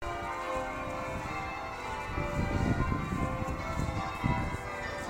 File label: winchester cathedral bells